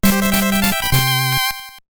Orb Recovered; X-Men Mutant Wars; GB

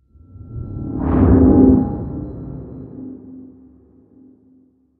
slingshot throb

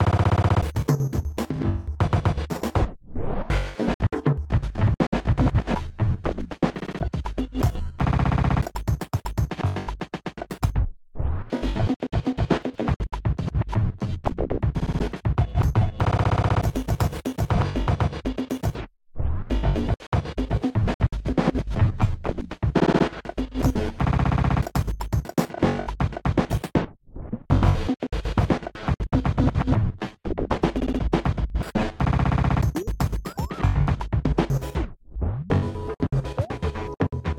Glitched Harder Event Music Loop; Going Under; The Working From Home

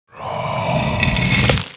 coffin; sandwraith

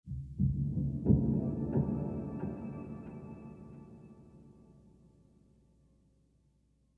Lap Steel Rhythmic; ML Lap Steel Rhythmic Mood 11

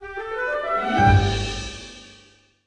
Intro Stinger; Candy Crush Soda; Honey

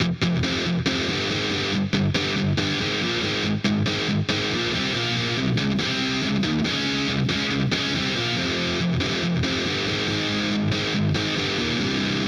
Space Guitar; Bust-n-Rush

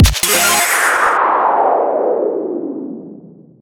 sfx level win; Super Glitch Dash; Android

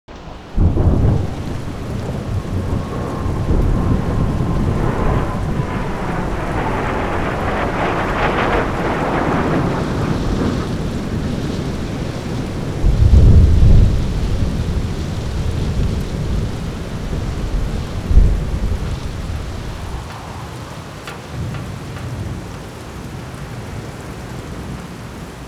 Lobby Ambience; gamerip; black future 88

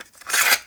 Remove Cassette

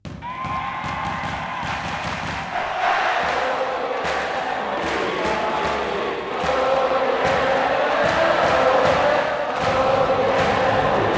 Chants - European Type

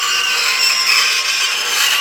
Train Crash Brake

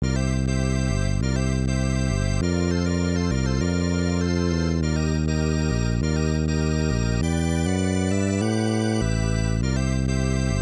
All Clear; Namco Classic Collection Vol. 2; arcade; Namco ND-1

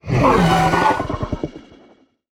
Dino Lose Battle; mighty party 2017 gamerip pc ios android

